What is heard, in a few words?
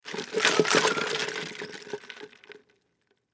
Cup Shake Move Slosh Styrofoam Ice; Shaking (Sound Effects:Objects); Blastwave; Foley